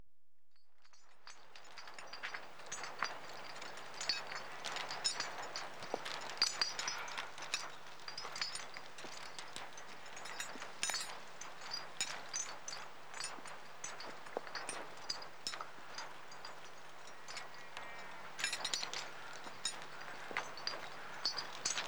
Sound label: Walking With Equipment, Chinks; BBC Sound Effects Library; Adventure Sports